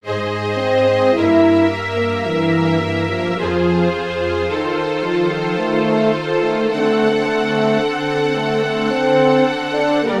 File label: for horn strings; haendel georg friedrich; behold and see if there be any sorrow